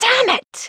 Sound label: hit; NWNDS; Annah